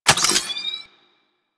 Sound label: Reload, Pistol; Amphis